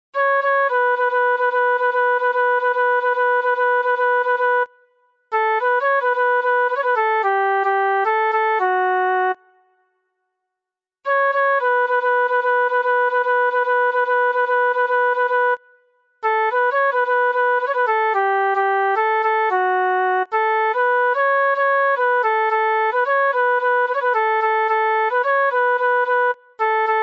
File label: Flute